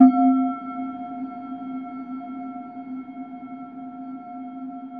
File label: bowed tail, sound bowl